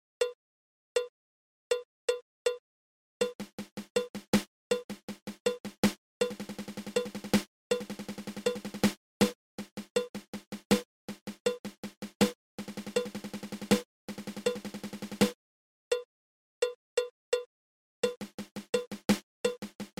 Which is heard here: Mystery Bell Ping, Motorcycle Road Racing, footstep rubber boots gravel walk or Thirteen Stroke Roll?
Thirteen Stroke Roll